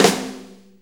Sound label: 6-8 Snare Flam; Drum Tools; Sonic Foundry (Sony Creative Software); Loops & Samples Drum Tools; Flams